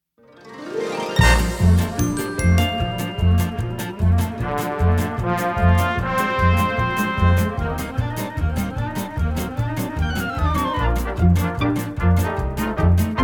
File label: Karaoke